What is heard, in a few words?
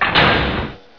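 gate start, doors; HEXEN2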